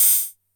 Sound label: HH Open, drum kit; Polyend; Stazma & Concrete Collage; Concrete-55